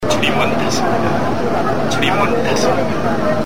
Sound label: Line door closing